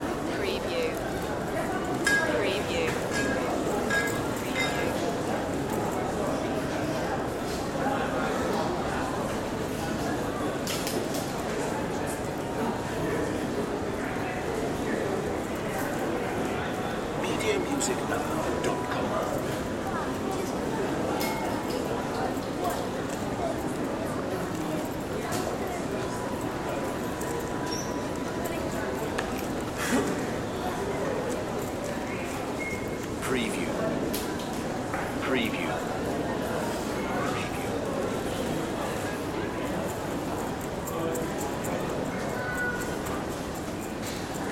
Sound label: moscow train station